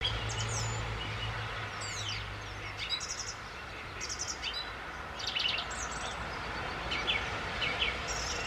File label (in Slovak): Drozd plavy